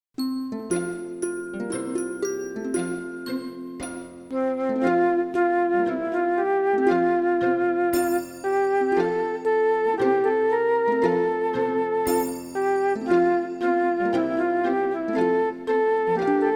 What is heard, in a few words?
Let Your Light Shine instrumental